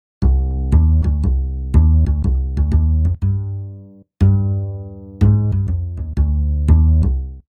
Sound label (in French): impro contrebasse; loto sonore